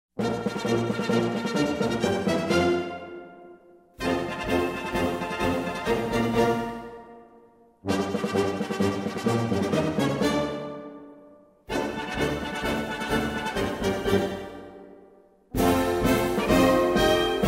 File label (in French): hymne italie